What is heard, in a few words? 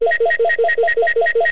STINGER OUT